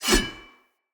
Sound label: Sword Whoosh; Weapons; Swords; Fenn Jotar Sword